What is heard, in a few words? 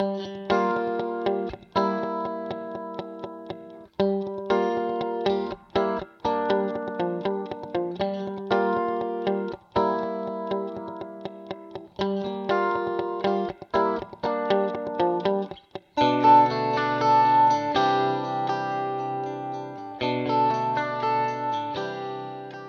Guitar Sony HR MP5 Plain Rotary Vintage Digital